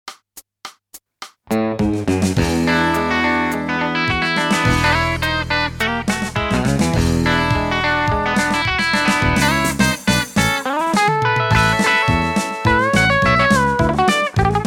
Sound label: country